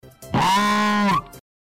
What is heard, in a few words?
beeep